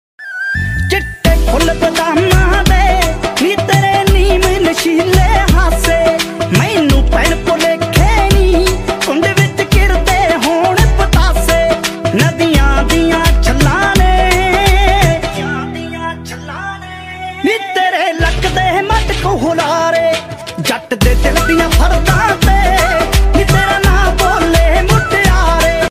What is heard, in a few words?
DJ Punjabi Ringtone